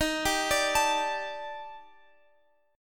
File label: Eb Major Sharp 11th, down, Guitar, Open F; arpeggiated